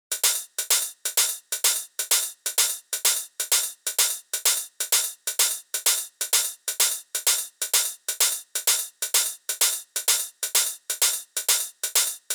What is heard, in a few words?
Top Loop - 128 BPM, Drum Loops; House; Cymatics